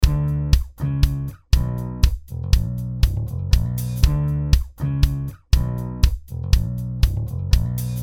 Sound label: Kick